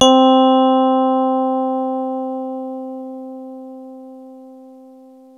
FENDER RHODES, E-PIANOS; Kid Nepro - Elite

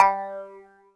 THROATY, PHASE; Big Fish Audio; Synth City

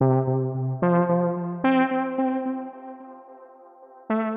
Synth 110BPM

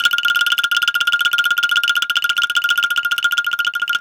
ANGKLUNG E4; Spectrasonics - Heart of Asia CD2

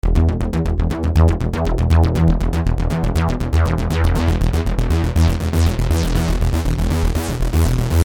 Syn-Bass s E, Techno Synth Loops, 120bpm; Sonic Foundry (Sony Creative Software); Signals I

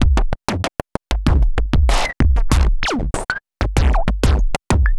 Drum Loop, Drum Loops

